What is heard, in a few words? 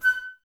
flute, Wood Winds, Flutes